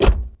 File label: close; Mafie 1